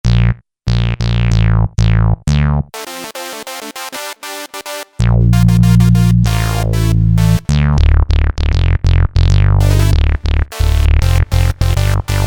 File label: Dance Split, Roland JP-8000; Factory Performances